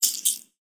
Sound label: coins; Matias Vidal Saavedra; Animated Explainer Video